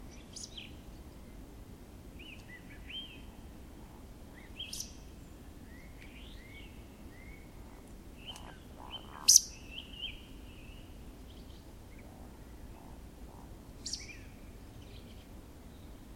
waldschnepfe

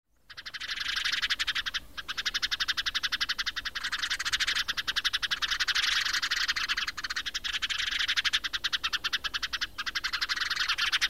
weidensperling

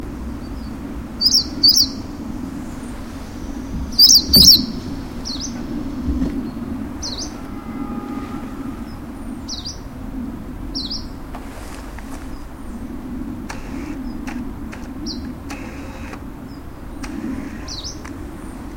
gelbbrauen-laubsaenger

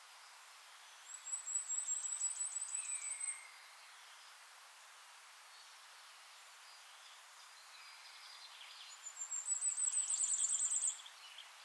sommergoldhaehnchen

